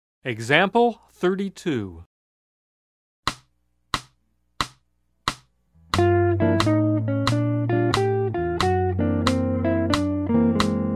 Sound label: Jazz